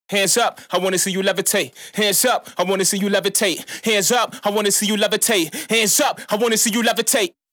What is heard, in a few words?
Vocals Heated Presence (After); Factory Expansion Pack